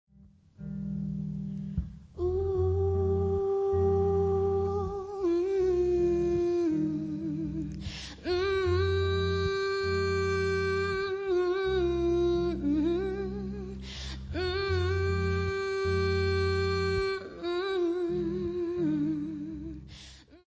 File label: worldly church music; wayne cordeiro